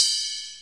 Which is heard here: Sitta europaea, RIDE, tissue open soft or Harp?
RIDE